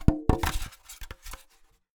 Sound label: Polystyrene Rattle